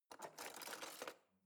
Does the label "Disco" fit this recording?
No